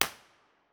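tunnel entrance c 1 way mono, impulse response, Innocent Railway tunnel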